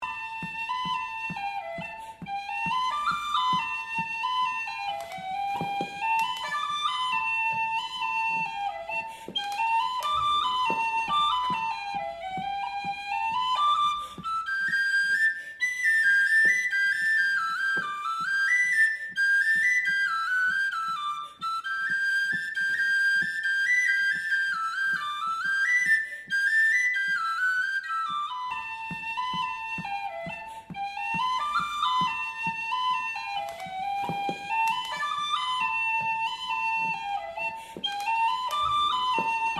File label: The Abbey Reel, 135BPM